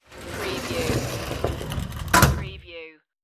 sliding door close

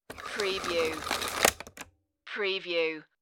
hoover cable coils up

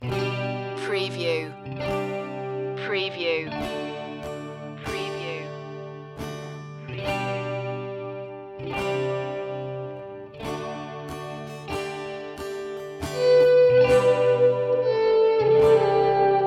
underscore only guitar

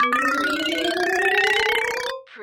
Mallets Ascend

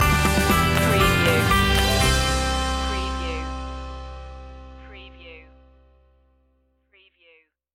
happy camper stinger

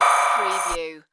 digital data glitch

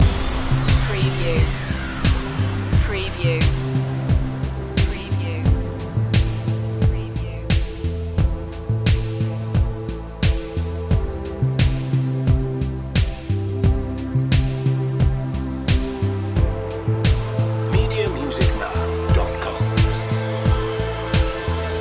falling blossom loop

